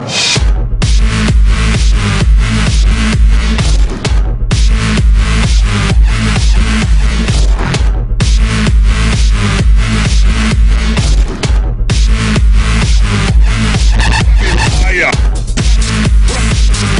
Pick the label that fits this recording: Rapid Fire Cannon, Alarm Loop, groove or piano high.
Rapid Fire Cannon